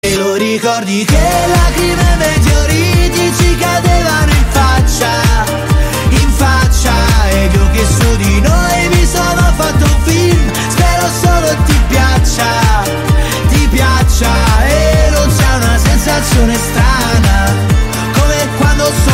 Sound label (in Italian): musica italiana; suonerie